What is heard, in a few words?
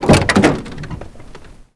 door truck military open; Doors; ModernWarfare